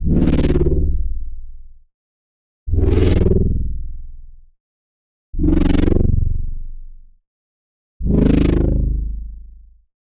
SFX Spinning Blade; Routed Sound; Serum 2 Expansion - Quantum Enforcer Vol.1

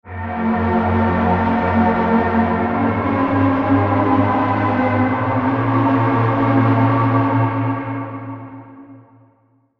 Pad; Divine Field; Routed Sound; Vital Expansion - Chaos Bringer Vol.1

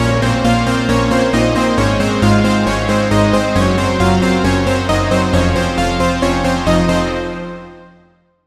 Lead, Serum 2; Lead - Hyper Oxygen; Routed Sound; Serum 2 Expansion - Reality Maker Vol.1

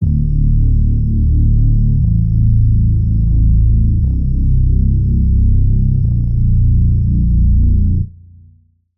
Reese

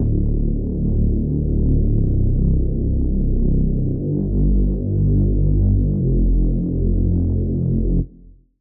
Reese; Malicious Intention; Routed Sound; Vital Expansion - Noble Gangster Vol.1

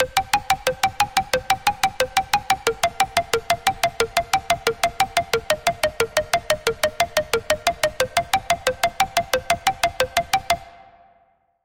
Pluck, Sylenth1; Routed Sound; Sylenth1 Expansion - Story Walker Vol.1

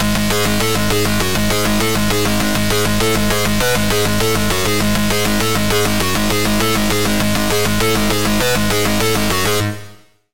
Lead, Sylenth1

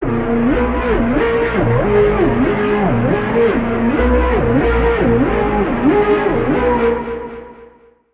Lead; Hammer Fist; Routed Sound; Vital Expansion - Quantum Enforcer Vol.1

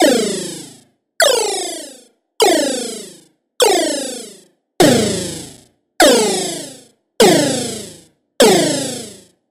SFX, Laser Shot; Routed Sound; Spire Expansion; Hyper Gamer Vol.1

